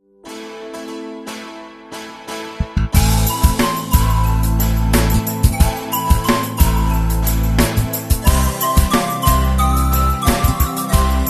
guitar studio

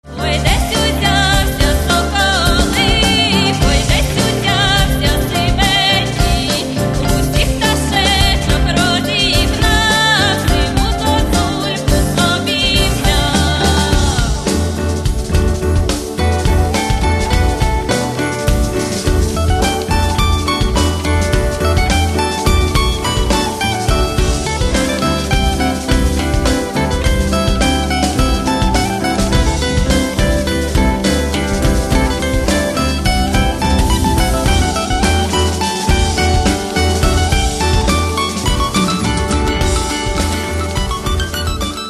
domra

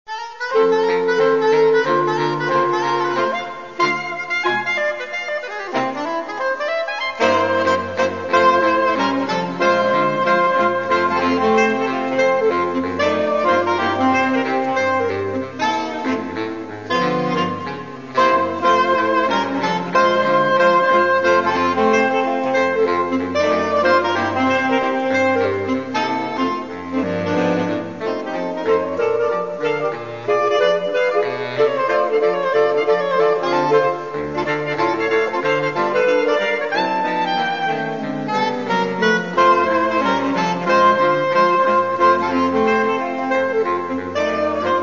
sax

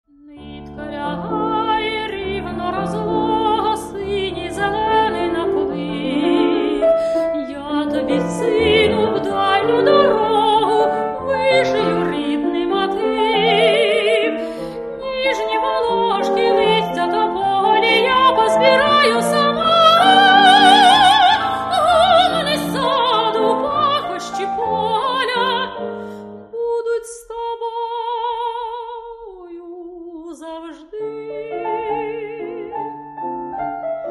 vocal miniatures

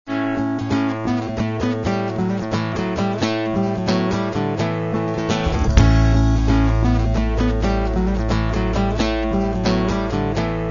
fusion orchestra

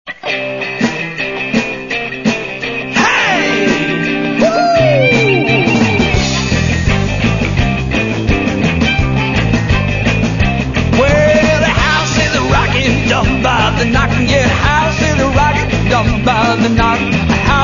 save the blues

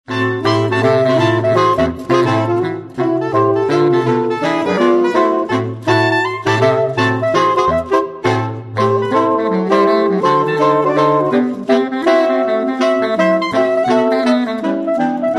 sax

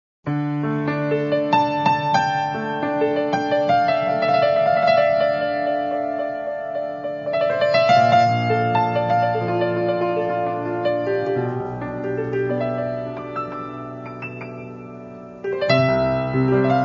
quiet jazz

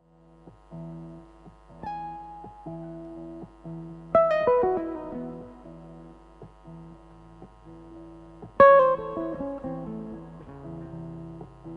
guitar live

